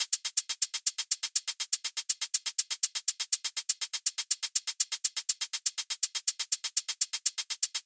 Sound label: 122 BPM Hat Groove Hats; mmb drum arsenal vol 1